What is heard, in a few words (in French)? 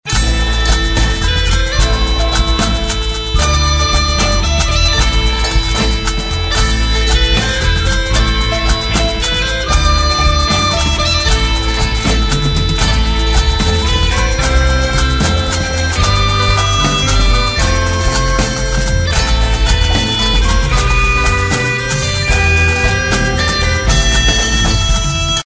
sonnerie; Occibreton